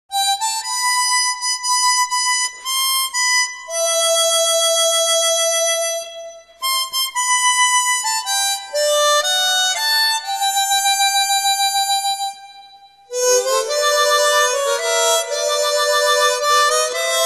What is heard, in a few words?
The Old Rugged Cross Chromatic Harmonica